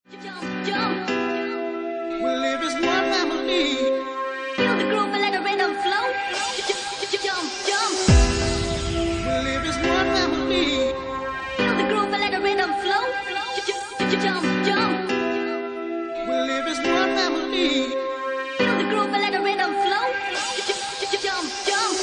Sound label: Twisted Bass